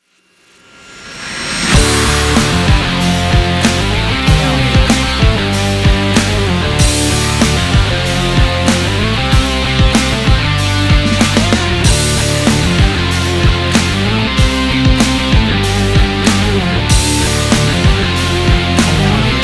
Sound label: run faster logo; MokkaMusic